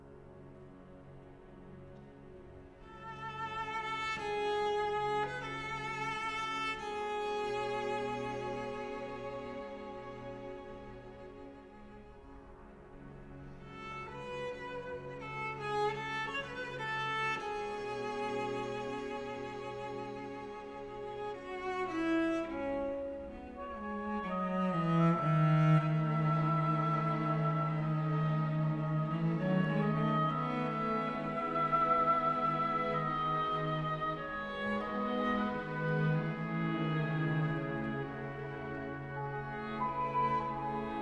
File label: cello concerto